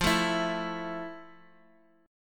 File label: F Major 7th; strummed; JGuitar